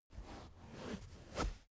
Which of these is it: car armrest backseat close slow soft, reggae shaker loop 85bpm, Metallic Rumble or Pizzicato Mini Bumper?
car armrest backseat close slow soft